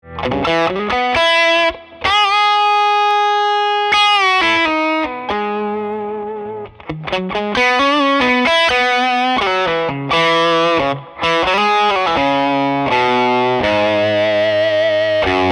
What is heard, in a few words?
Boost with lots of Gain solo; Ikon